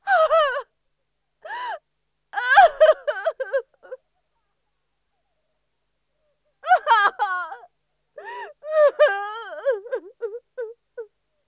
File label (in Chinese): 痛哭